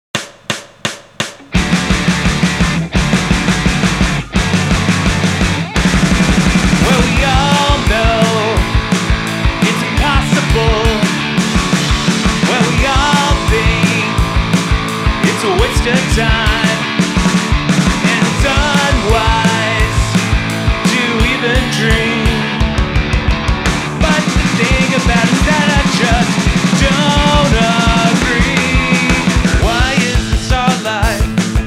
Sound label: 171bpm